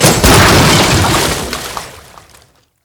Carriage Underlay Death

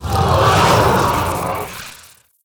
Steel Rager

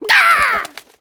Attack

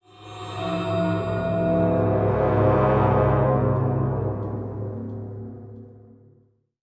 Cursed Play Stinger

